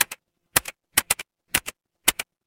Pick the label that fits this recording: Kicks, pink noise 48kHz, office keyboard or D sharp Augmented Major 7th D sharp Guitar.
office keyboard